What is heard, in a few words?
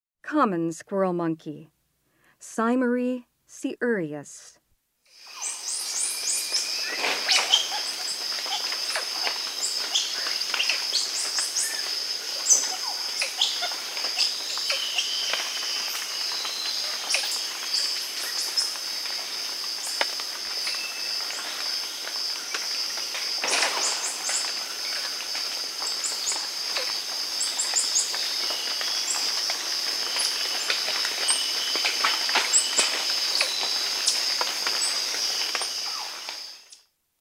Common squirrel monkey; S. America; Rainforest